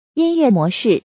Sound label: music, 提示音; soundbox